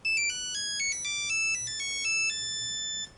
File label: nokia 3210 nokia tune ringtone